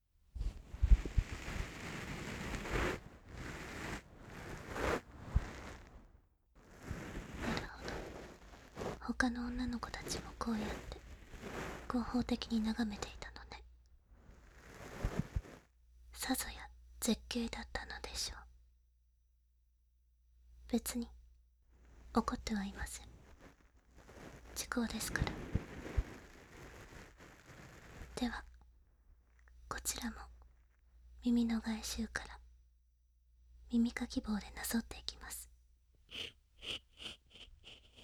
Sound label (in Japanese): 耳かき（左）